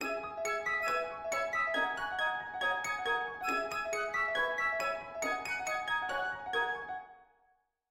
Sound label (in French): boite a mus